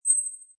Enterprise, Button sound or menu sound; Multimedia

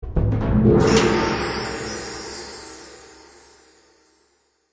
Accent Magic, Music, Accent, Magic, Mystical; Musical Sound Design; BlastWave

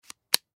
Floppy Disk Slide Protective Metal Piece; Noise; Technology; Blastwave

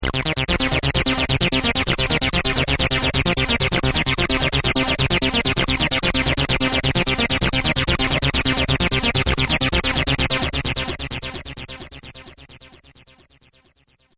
Synth 303; Button Sound Effects; Multimedia; Partners In Rhyme

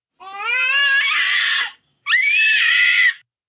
Baby Cry Shriek, 1 yr., short, loud, very angry; kid, child, infant; human voice; Crying Sound Effects

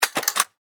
Steampunk Switch; Steampunk Sound Effects; Steampunk (Sound Effects:Science Fiction)

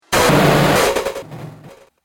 Droid Out Of Order, robot; Robot Sound Effects

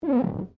GLASS SQUEAK FINGER

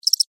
Insect Cricket Chirp Single; Insect Voice; Animals

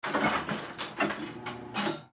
Clay Pigeon Shooter Load; Miscellaneous Sports; Blastwave

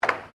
Air Hockey Rebound Double, Air Hockey table

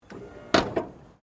CAR MAZDA RX7 SERIES3 EXTERIOR LIGHTS DOWN; 1983; Cars (Sound Effects:Vehicles)

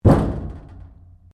Strike Metal Tank Exterior

